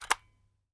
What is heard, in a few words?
Close Switch Hatch On Tape Machine